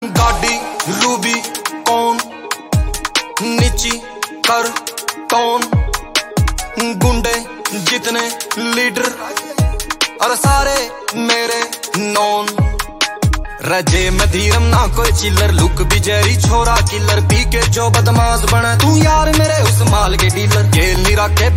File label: Ringtone